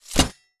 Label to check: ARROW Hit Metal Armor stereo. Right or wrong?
Right